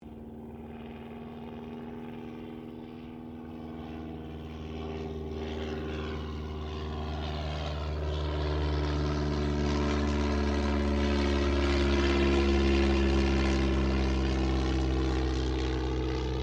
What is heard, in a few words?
AIRCRAFT DH82A DEHAVILAND TIGERMOTH PASS; RAAF Tigermoth; Airplanes (Sound Effects:Vehicles)